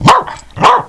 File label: Dog Barking